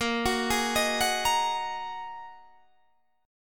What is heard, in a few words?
A sharp 7th Suspended 4th Sharp 5th down Guitar Standard; arpeggiated; JGuitar